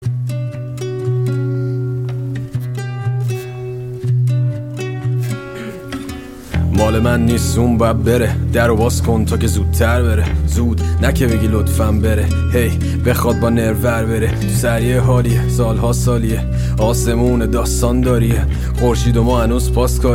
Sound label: Acoustic Version